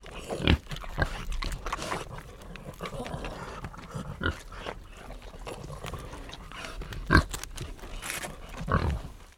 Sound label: pigs kunekune x2 grunt snort and eat